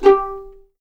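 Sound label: G4 pizzicato mezzo forte left, violins; East West; The Ultimate String Collection; VLNS PIZZ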